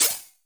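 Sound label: WARP hi-hat open, effected drum; 90 sSampleCDs; Crypton - DrumBase5000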